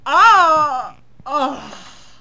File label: technician die; megaglest